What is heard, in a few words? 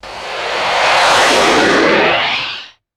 short intense rocket engine blast; aircraft